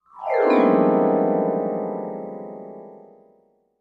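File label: Music Transition Vibraphone; mallets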